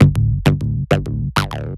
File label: bass 303 bark kit; Polyend; Recue